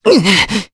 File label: Vox Damage jp; voices; heroes; Pavel; kings raid